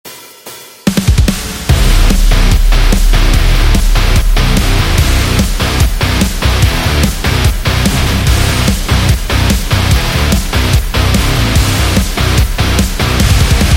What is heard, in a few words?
Fight, electro rock